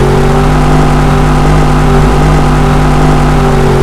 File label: high, engines; BMW M5